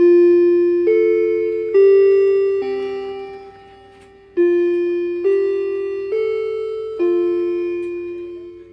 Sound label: office clock; fnaf doomsday